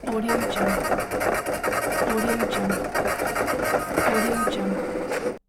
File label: cash counting machine